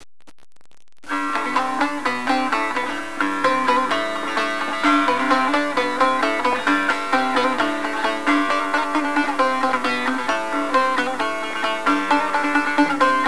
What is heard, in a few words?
instrument saz